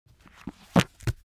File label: object book heavy book shut; smartsoundfx